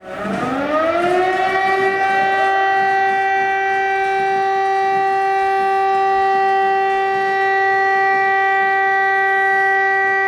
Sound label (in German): Sirene; Obermillstatt